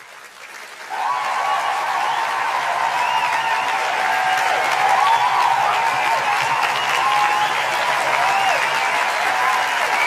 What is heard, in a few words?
AUDIENCE CLAPPING